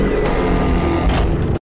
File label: speaker alert; titan